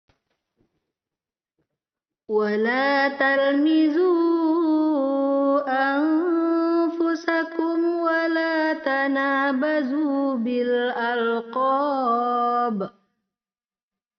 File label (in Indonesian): Perekaman baru Karaoke denoised; ayat-ayat ghoribah; naql